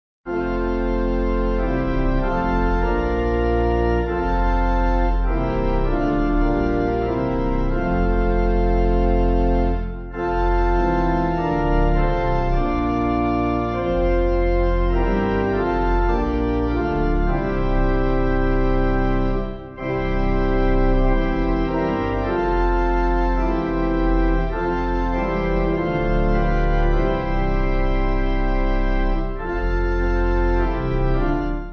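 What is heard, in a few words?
Pipe, Pipe Organ backing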